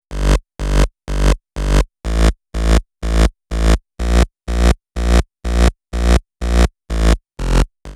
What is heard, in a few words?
blower3 16 beats